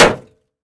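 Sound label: weak light hit on metal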